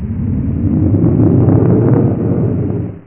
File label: Low Hall Wind; The Haunted House 1.0.2